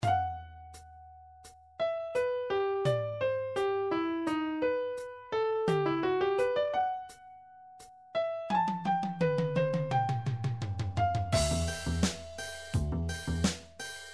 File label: acoustic jazz remix; super nintendo; sequenced; final fantasy iii; awakening; curzoh